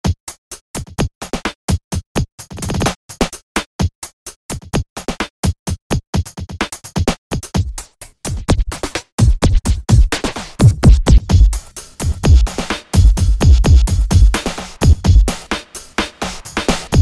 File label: Drumkit; Easter Egg Drumkit